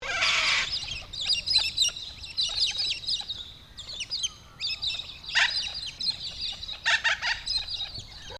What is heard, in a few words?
BIRDS COCKATOO SULPHA CRESTED FLOCK, Australian Cockatoos; Animals